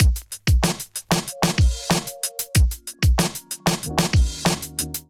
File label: Moonlight Kit, Drums; Ableton; Factory Packs; Chop and Swing